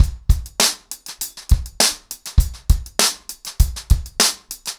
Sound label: 100BPM, ACOUSTIC DRUMS; The Roots Drumloops